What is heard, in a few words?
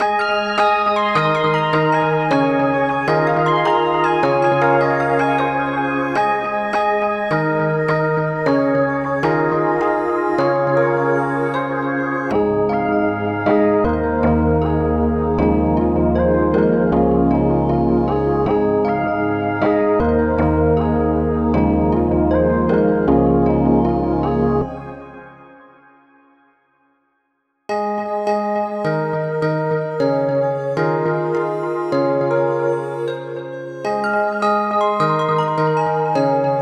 van beats, 156